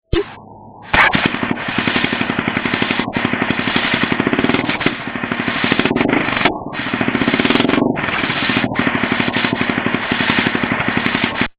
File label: sound single muff